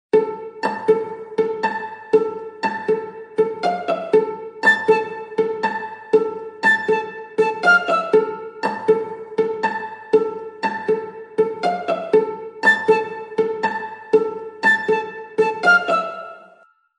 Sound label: samsung j7 pro classic ringtone